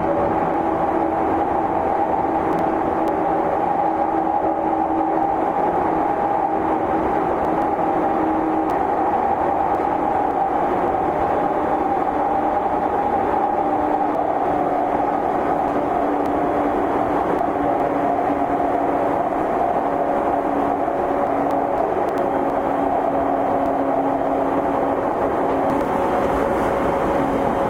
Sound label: E231; tetsuon